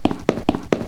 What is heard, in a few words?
steps; chateau